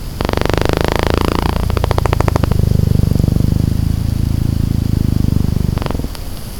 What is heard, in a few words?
spatial candle buzz